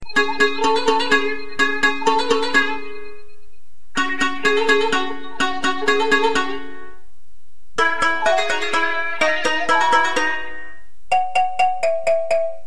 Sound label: Iphone